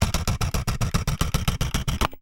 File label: Camera Sequence